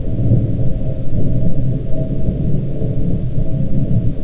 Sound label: strange lab; valve; coil